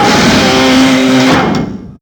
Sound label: STAB